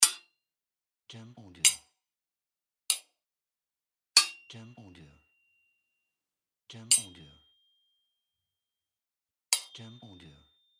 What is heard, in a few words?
Metallic Strike